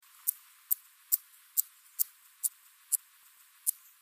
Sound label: Dociostaurus jagoi occidentalis; BIOAC ORTH